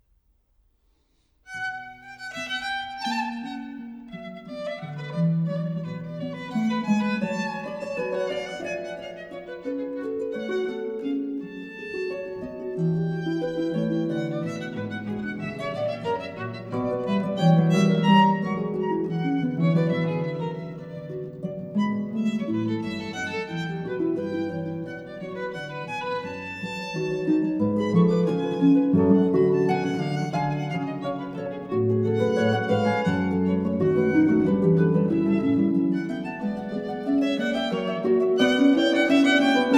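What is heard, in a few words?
Sonata for Violin and Keyboard; Allegro; J.S Bach; 2. Allegro; Sonata No. 1 in B minor, BWV 1014 for Violin and Harpsichord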